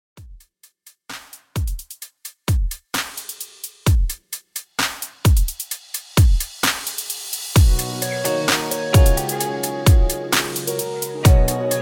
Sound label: REDRUM 65 BPM